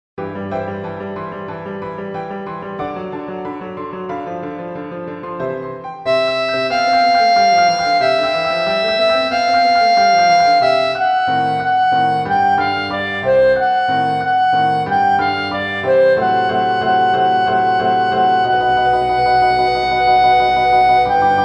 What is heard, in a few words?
Soprano; yeomen; A Thousand Marks